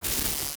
sfx research station lightening Small; Stellaris; nemesis

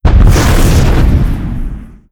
swarmer missile fire; weapons; Stellaris

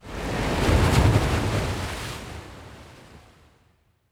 ocean planet; ambient; Stellaris

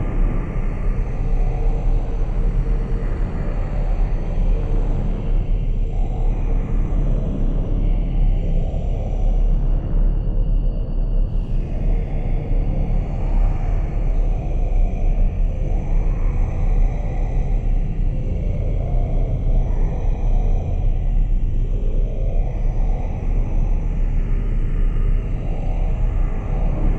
ship colossus idle; Stellaris; shroud; ships

